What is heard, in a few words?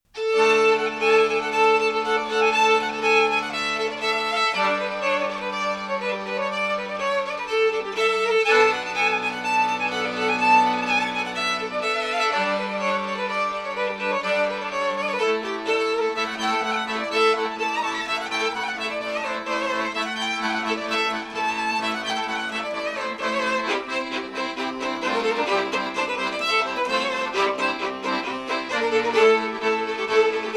Romanian Dance